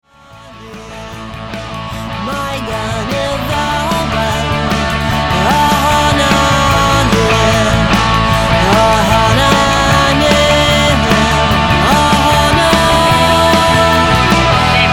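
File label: pistyll violin solo excerpt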